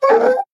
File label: adlet hurt, utterance